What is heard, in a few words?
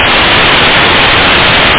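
hi hat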